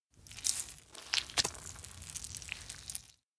dog pee poop; anemoi

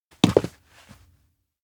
steps outdoor rock land boots; engboots; aino; anemoi

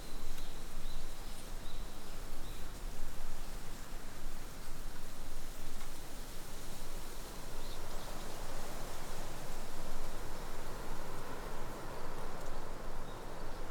outdoor mountain foot; anemoi

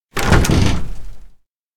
broadcast room door open bang; anemoi

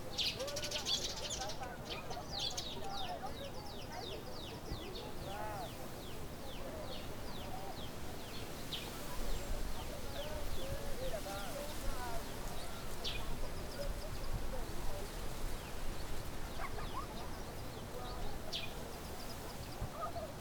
morning outdoor birds chatter; anemoi

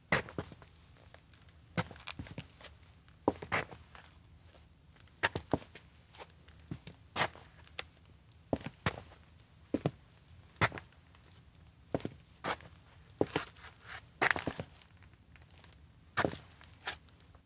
steps outdoor observatory walk slow; LOOP; awayuki; anemoi

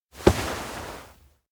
hug; anemoi

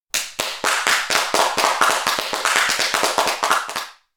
applause two; anemoi

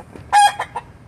Chicken; anemoi

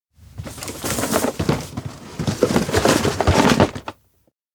luggage spread; anemoi

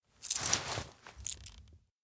pendant pick clench; anemoi

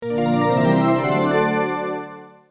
fanfare; anemoi

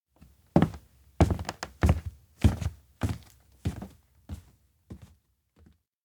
steps indoor abandoned cafe walk deeper; anemoi

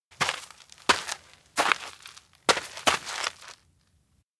steps outdoor forest walk; anemoi

